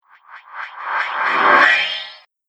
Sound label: Poltergeist On The Move, ghost; Sinister, Scary, Spooky Or Just Silly